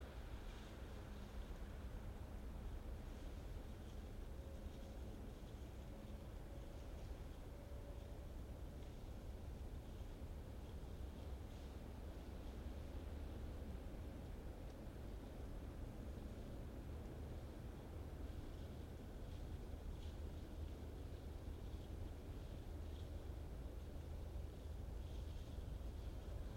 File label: yamanakako sound